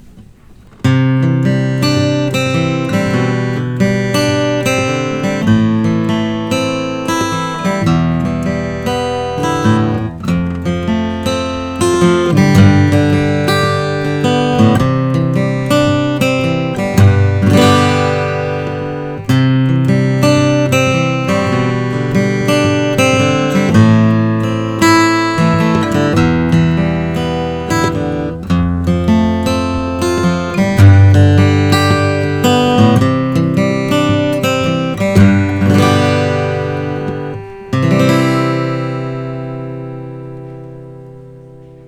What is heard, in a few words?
bourgeois signature om